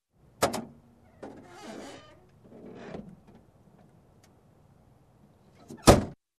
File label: Open Closing old trunk